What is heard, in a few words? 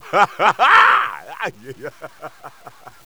Laughing Studio